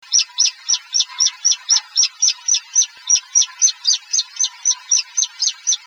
call indiv vswift

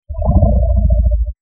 indiv wings vswift

